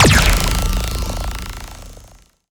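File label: Sci weapon Shot Beam Medium Metal Melter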